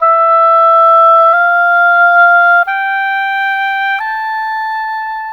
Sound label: Oboe, Cinematic; Sonic Foundry (Sony Creative Software); Orchestral Series VOL-3; Cinematic 24